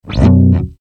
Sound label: instruments bass; maoudamashii